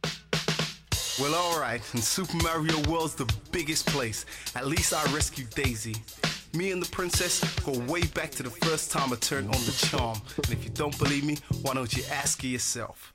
Funk, Radio Compact Disco

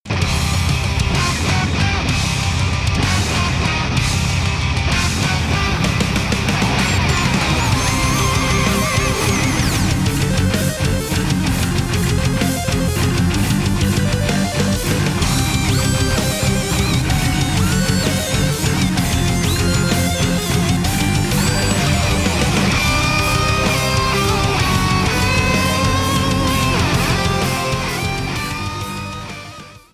Castle BGM Arrangement; SMW

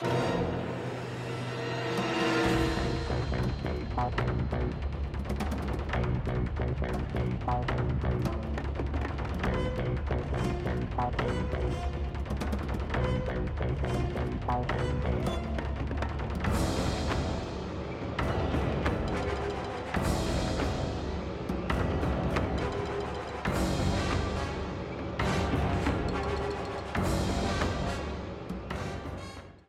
Paper Macho Chain Chomp, A theme

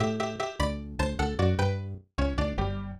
Game Over; SMAS; SMB3

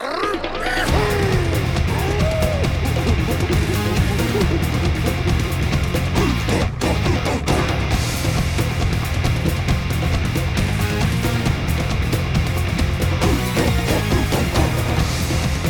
Instrumental Theme; DKB; Kong Bananza

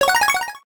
The sound that plays when a 'GREAT' ra; MLPIT; Rating Great

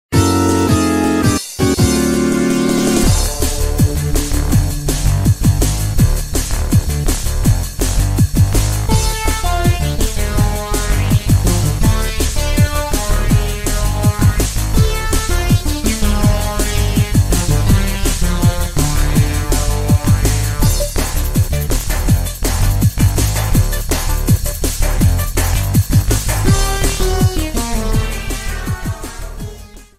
Castle Boss Theme; NSMBW

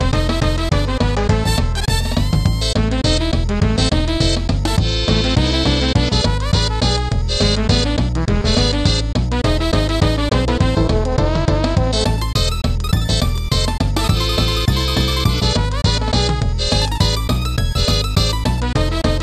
Token Tango; DKC2 SNES

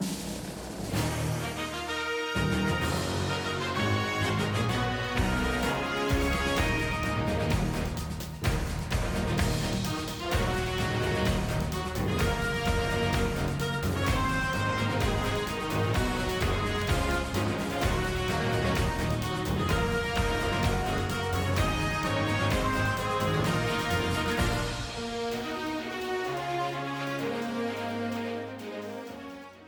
Airship Launch

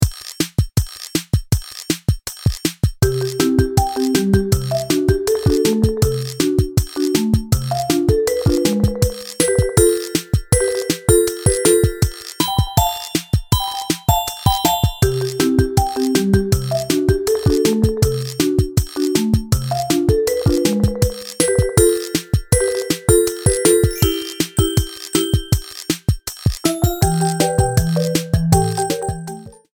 Drawing Theme

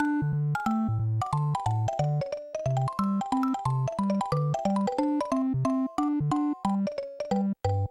Catch and Win Theme; SMM2

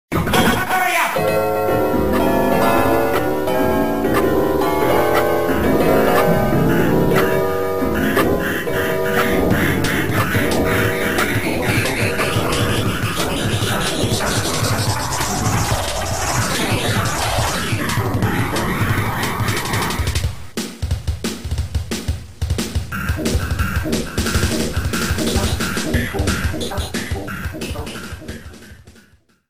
music Hurry Up!; Wario Land 4

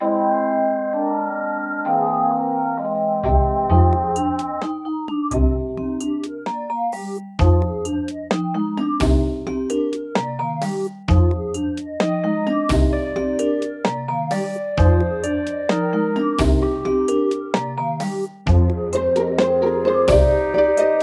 Dark Forest; SMBW

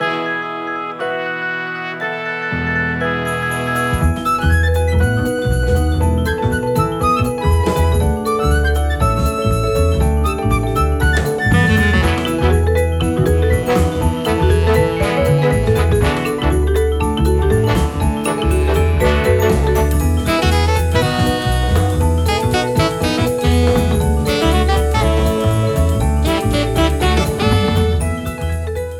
Snow Rise

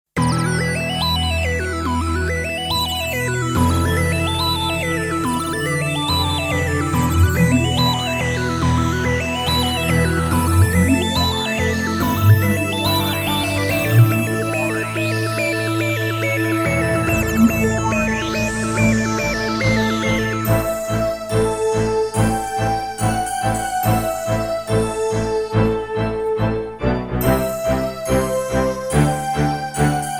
Undersea; Buoy Base Galaxy; Super Mario Galaxy

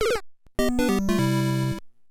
Player Down - Bonus Chance: Lose; Super Mario Bros. 2; Super Mario Maker; Peach

